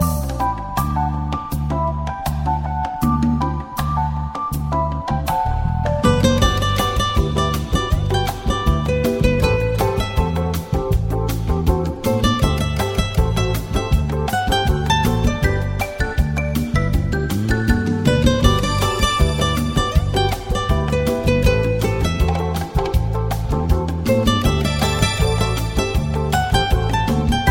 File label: Cute Anime Theme